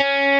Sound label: Arp. x 4